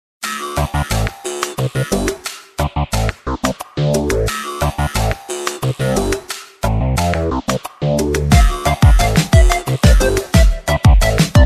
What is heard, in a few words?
happy birthday music